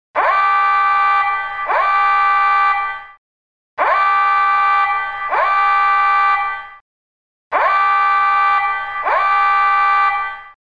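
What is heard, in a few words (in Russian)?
звук сирены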